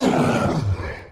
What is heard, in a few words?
groan, zombies